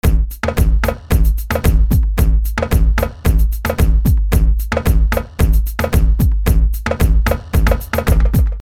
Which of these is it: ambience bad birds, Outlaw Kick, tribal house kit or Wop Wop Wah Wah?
tribal house kit